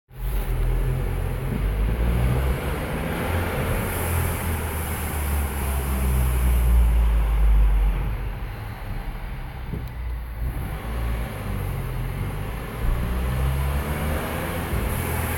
metallic sound when deaccelerating mini

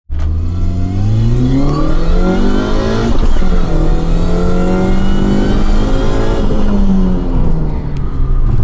r53 windows up; hyper blue havoc

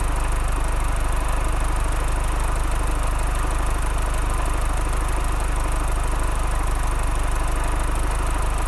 cooper s engine; normal r53 engine bay sounds; mcs

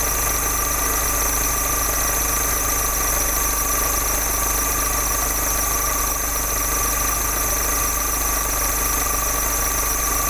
weak tensioner or bad belt cooper s belt squeal; r50-r53; 2002-2006 hatch

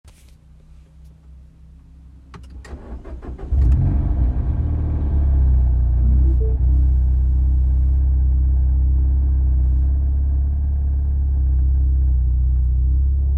bottom end noise start stop engine; r56; hatch

